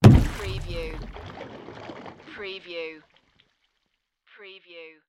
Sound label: Boat Impact Splash; sound-effects-bible